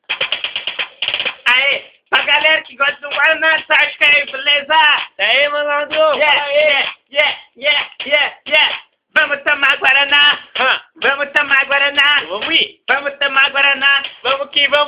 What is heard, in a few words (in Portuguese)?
funk do guarana